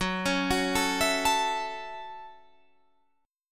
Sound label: Gb Minor 7th